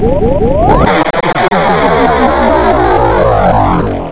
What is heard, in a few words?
laser